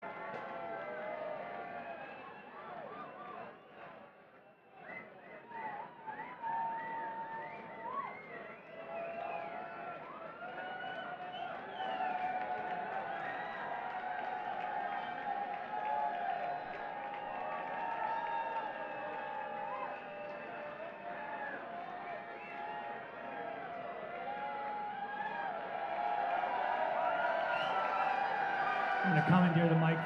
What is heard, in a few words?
Banter Crowd